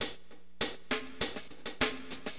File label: DM LOOP, HipHop, Drums